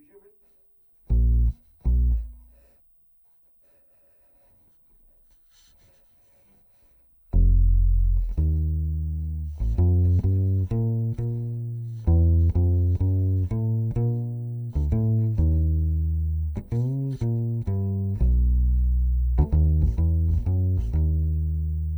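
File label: Contrabass DI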